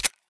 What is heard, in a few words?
deagle special look at; cstrike; csgo